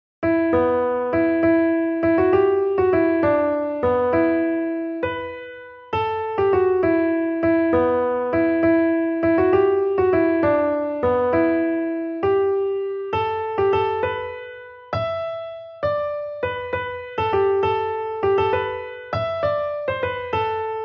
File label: melody; the son of god goes forth to war